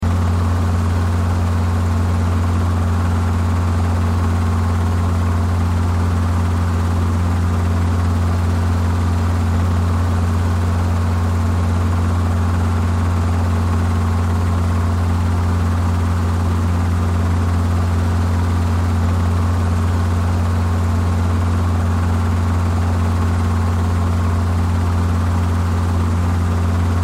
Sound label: Helicopter Interior In Flight Loop; Transportation Aircraft; Helicopters